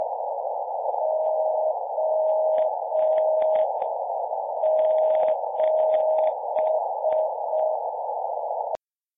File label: IF peak; SuperQRPtest; Jirka OK2RZ